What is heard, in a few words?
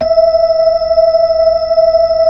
B-3, Hollow; Roland LCDP10 Keys of the 60s and 70s 2; Hollow Xfade